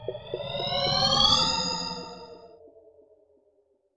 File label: CONTROL CAST